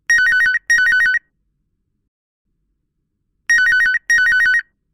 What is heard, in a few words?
work calling